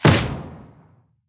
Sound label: Juggernaut Missile launch